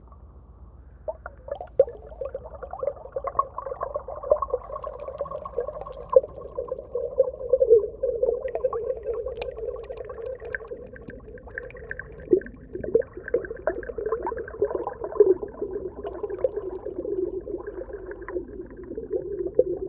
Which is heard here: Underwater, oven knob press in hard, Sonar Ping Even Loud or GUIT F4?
Underwater